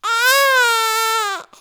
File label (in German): Babyschrei Deutsch